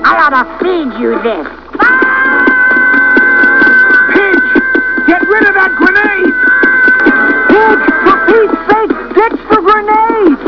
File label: grenade